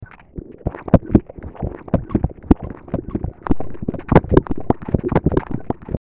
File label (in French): chanson